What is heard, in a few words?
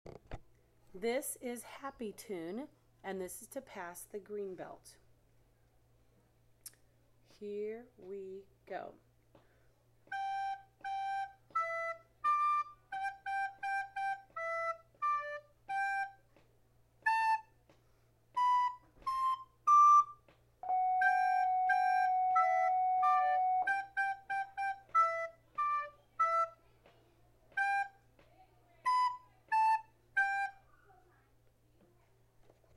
happy tune